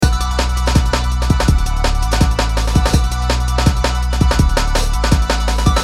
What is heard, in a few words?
Space DnB Loop